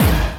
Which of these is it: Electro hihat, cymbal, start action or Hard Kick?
Hard Kick